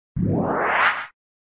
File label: Sound SFX, flipper